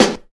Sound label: tight low end snare one shot g key, snare one shot tuned to the G note; snare drum samples